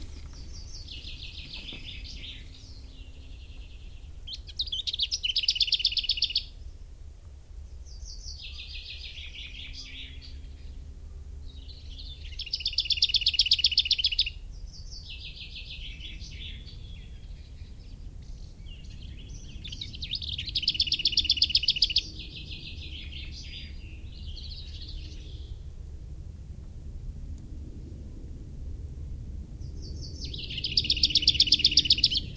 kis poszáta hangja

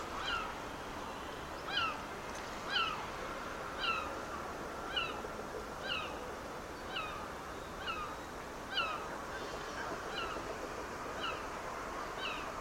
sargalabu siraly hangja

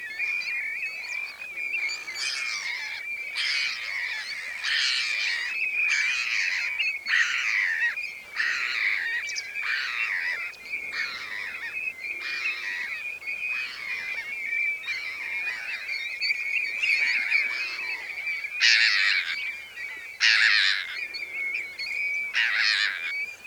dankasirály hangja

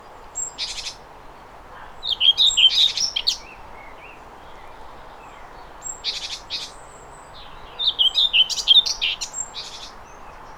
mezei poszáta hangja

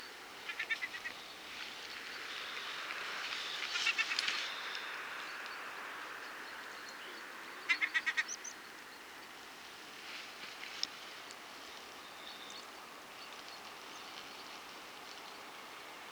ezustsiraly hangja

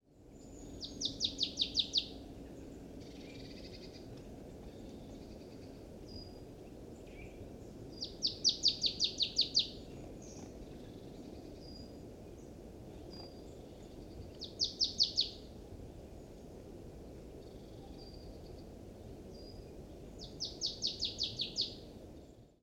baratcinege hangja

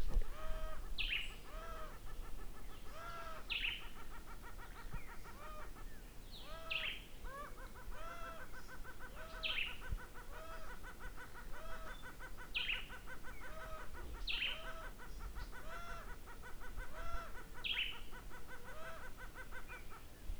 kigyaszsolyom hangja

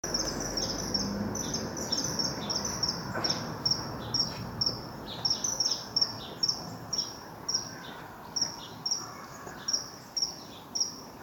jegmadar hangja

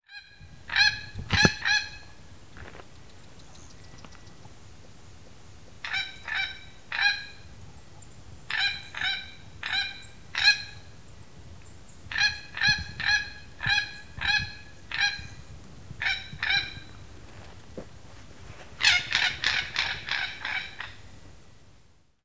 laposcsoru hegyitukan hangja

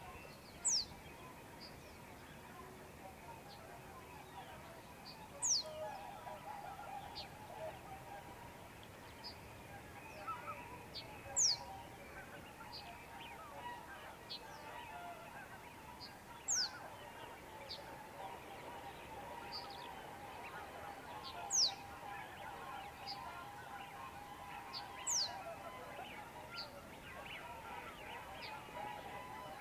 nádi sármány hangja

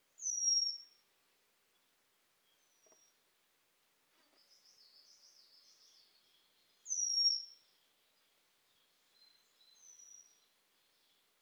fehérfejű villásrigó hangja

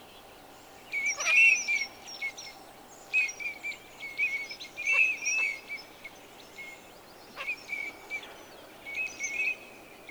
csorgo rece hangja

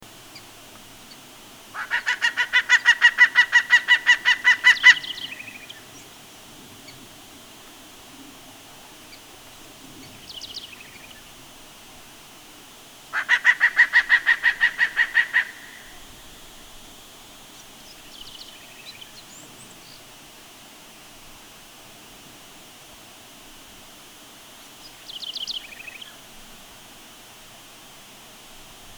óriásölyv hangja

